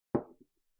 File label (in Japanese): グラスを置く音